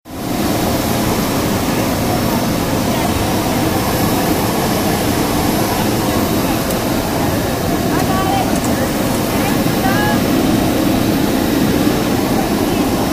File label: Jet Noise; Gretsch; coney island rockabilly